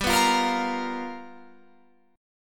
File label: G 7th Sharp 9th G chord, Guitar Open F; G7#9 strummed